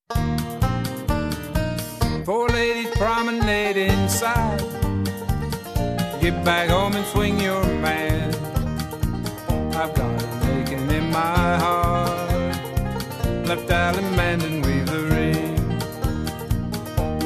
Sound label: vocal, square dance